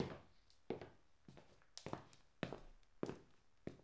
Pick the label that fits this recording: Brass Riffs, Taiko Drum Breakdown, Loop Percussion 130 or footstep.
footstep